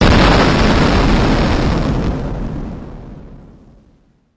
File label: ningyou bomb